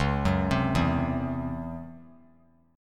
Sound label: C sharp Minor Major 7th Double Flat 5th; arpeggiated; 13,13,15,14; 3C,3F,3A,3D